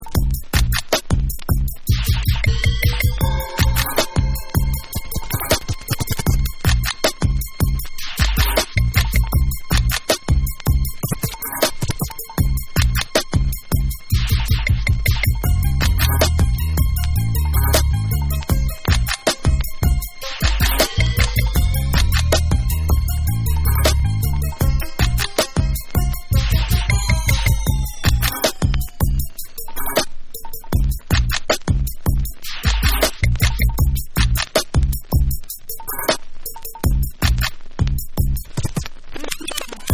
Club Mix Instrumental

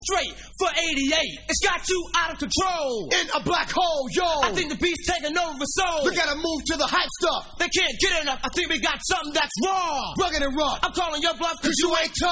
Acapella

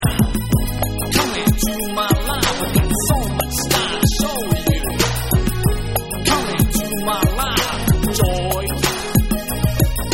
Extended Hip Hop Version

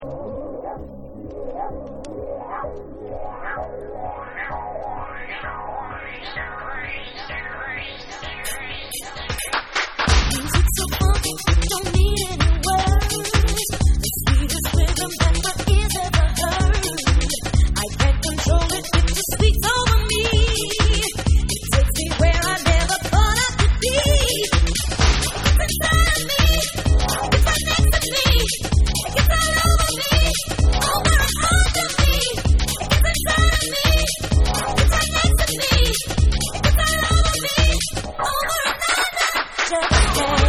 Main Vocal Mix

